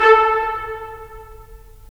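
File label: brass trumpets staccato, left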